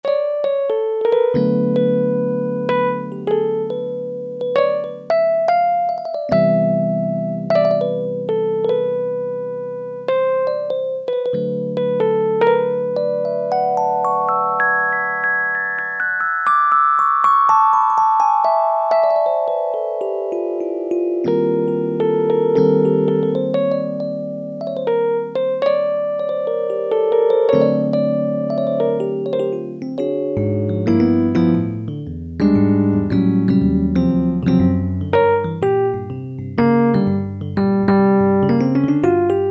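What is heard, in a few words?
Electric Piano, Roland U-110; Factory Patch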